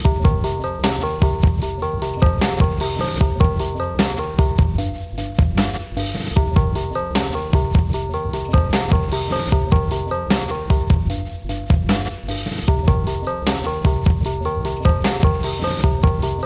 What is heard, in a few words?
beat; Hip Hop Instrumental